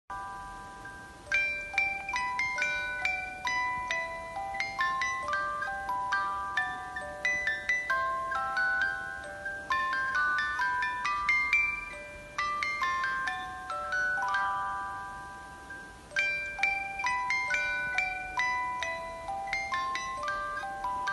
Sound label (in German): Spieluhr Entchen, Schubert